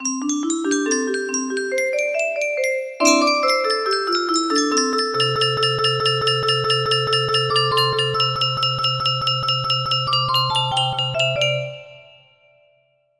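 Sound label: pentatonic scale, music box melody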